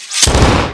attack

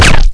BEATTACK

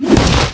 ATTACK

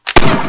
attack

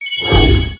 ATTACK

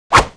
SKILL ATTACK

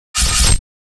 ATTACK

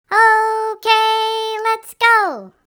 EMOT CHEER

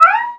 BEATTACK

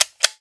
INVEN OPEN

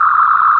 ATTACK STAFF CHARGE

